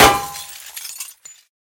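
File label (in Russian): metallic ring with falling glass shards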